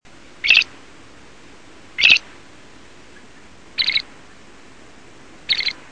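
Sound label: seryy sorokoput golos, great grey shrike voice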